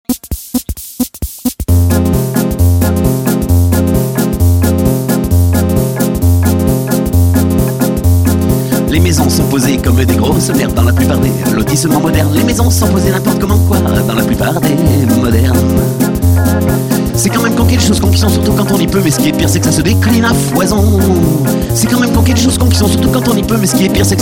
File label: Soft Rock